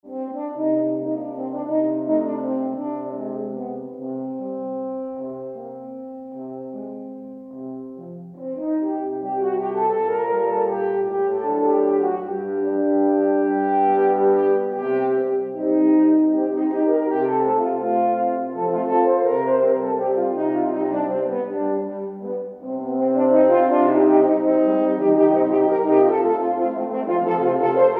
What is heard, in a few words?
Waltz from Siljansnas and Ratvik